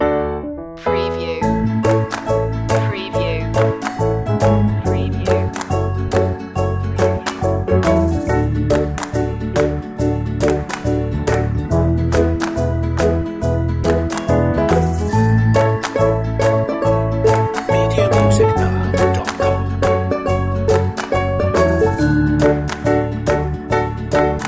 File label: upbeat piano commercial